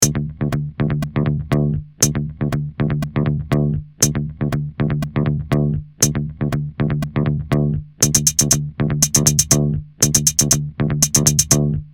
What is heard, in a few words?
New beat